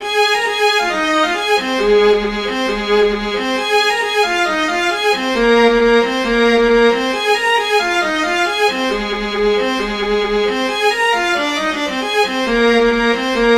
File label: Strathspey